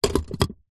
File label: foley brick drop on concrete block; zapsplat